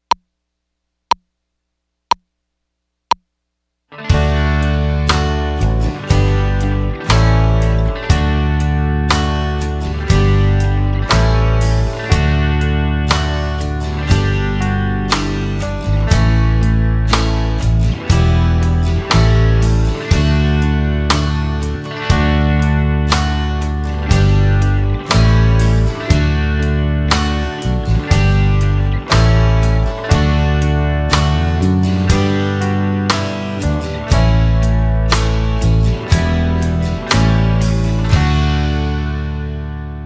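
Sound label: D Melody Without Guitar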